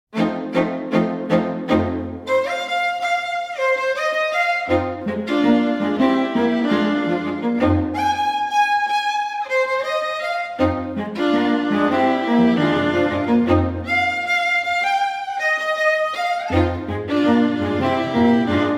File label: happy string quartet; nyc